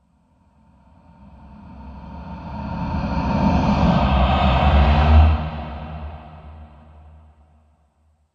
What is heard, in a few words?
Deep Space Demon, Alien Sound Effects